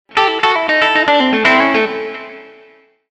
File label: country